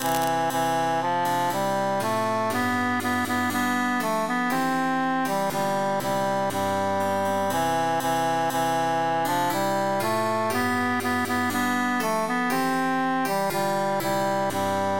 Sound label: tenor emphasized; since first i saw